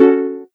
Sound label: soft hitwhistle; osu